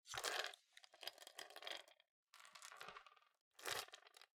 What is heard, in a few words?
Air Take; MiSide